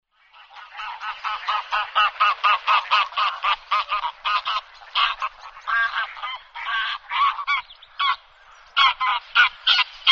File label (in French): Tadorne, Tadorne de Belon, Les oiseaux des etangs